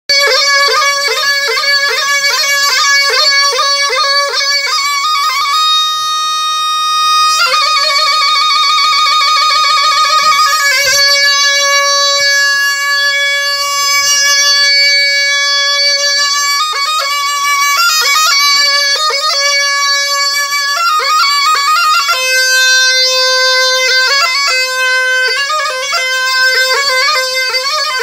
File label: Qushmeh, ساز قوشمه